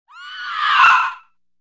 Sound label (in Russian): zhenskij krik stereo effekt sleva napravo